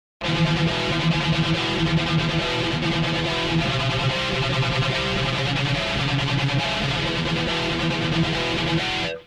thrash

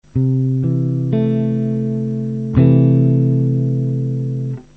c add6 shell

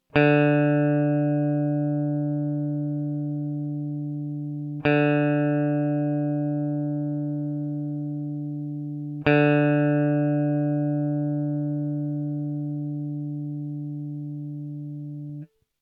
open G, D

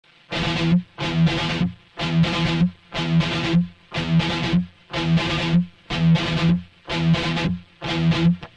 thrash

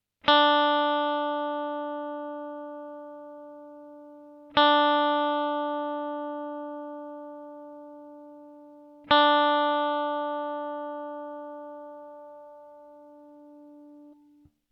open D high D

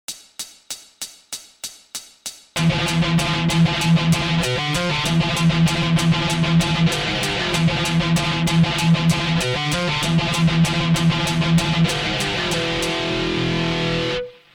thrash

